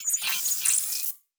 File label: Device Start, Electric; Sci-Fi Sounds